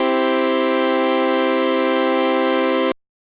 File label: FM example